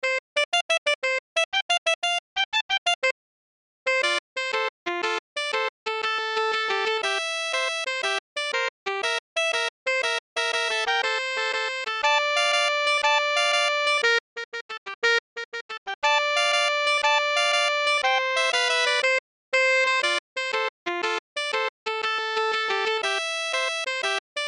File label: March - Sax Duet